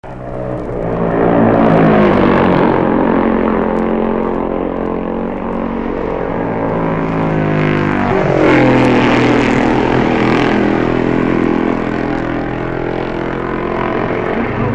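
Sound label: Norton, motorcycle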